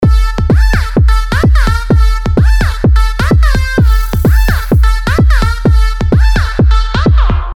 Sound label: Sylenth1 dirty dutch leads; Pannenkoek; revealed sylenth1 dirty dutch leads vol 1